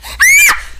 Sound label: scream